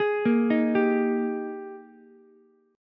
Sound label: Ab Major 7th Suspended 2nd Ab Ukulele; AbM7sus2; 13,10,11,10; arpeggiated; chordshape; JGuitar